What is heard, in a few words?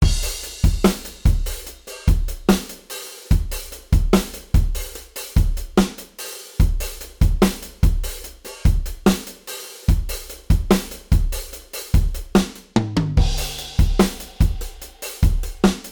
modal surf, drums